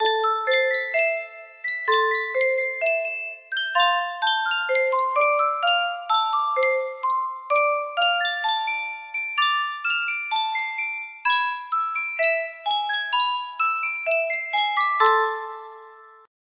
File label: Yunsheng music box, music box melody